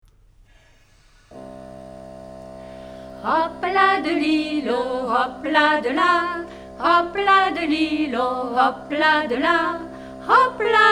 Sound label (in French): Hopladeli Rythme scottisch Vx Fa lent